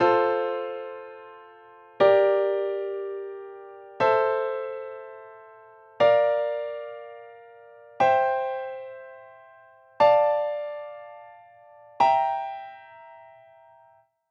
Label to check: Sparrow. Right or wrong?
Wrong